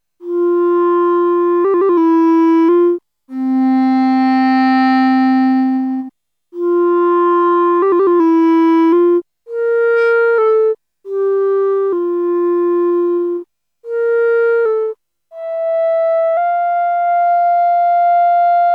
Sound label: Clarinet Add tune